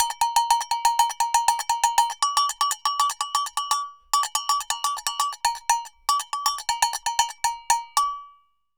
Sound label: Agogo Samba 120 bpm; Best Service; Festa Latina VOL-1